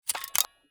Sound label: u lock handling